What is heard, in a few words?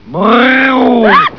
brgh